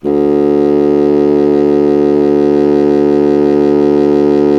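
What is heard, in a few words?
wind contrabassoon; Miroslav Vitous; Solo Instruments VOL-1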